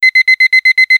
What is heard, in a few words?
Alarm; Kerbal Space Program v1.0.5; KerbalAlarmClock